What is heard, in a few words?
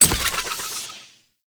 Glyph Prism Hit; Warframe; Duviri; Glyph Puzzle